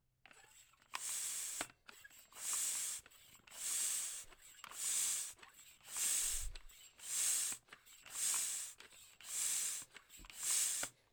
Bicycle Pump

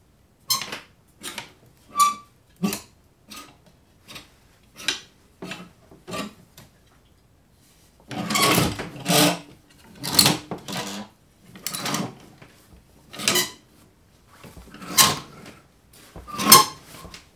Moving Hangers With Clothes On Them In Wardrobe

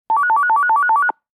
Phone Ring Digital

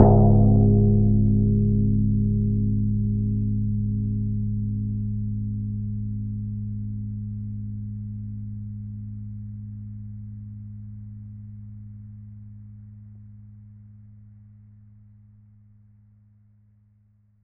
gong

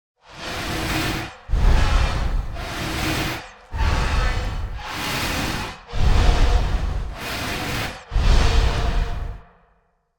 Huge Weird Breathing Sound Design

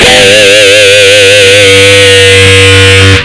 3rd Fret Harmonic

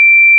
Synthetic Shot Timer

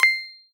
Coin Pickup

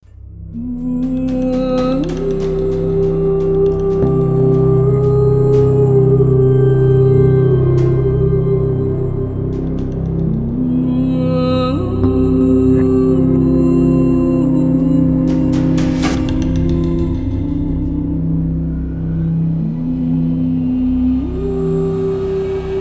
Cinematic Oriental Trailer Riser

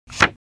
Book Sounds Big Page Flip

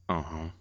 Un Hunh

